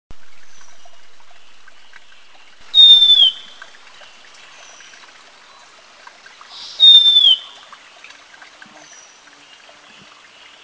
Long-billed Wren Babbler, Eaglenest, India

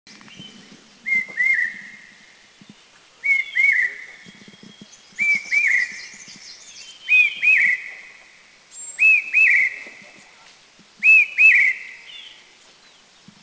Large Hawk Cuckoo, Dirang, India

